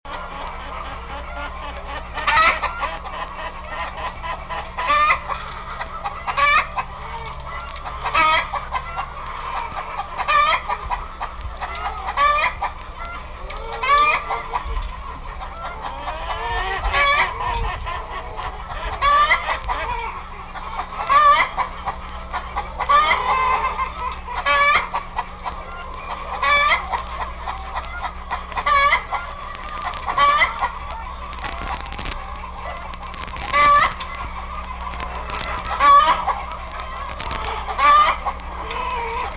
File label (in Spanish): gallin